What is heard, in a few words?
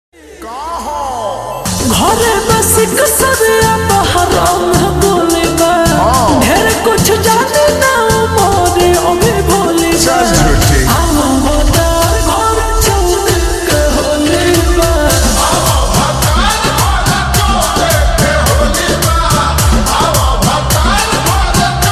Slowed Reverb ringtone